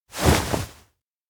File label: raise hands fast; anemoi